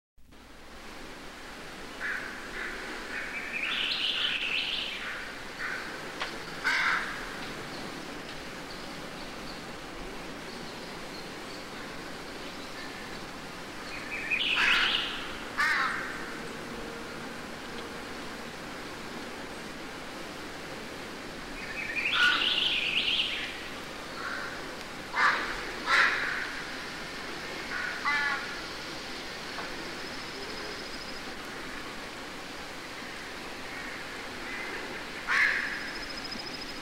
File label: woods birds crows leaves